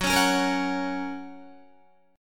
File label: Gb Major, Guitar; Gb strummed; JGuitar